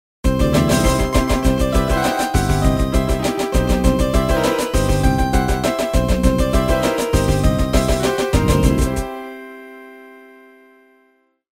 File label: wrong backing; cave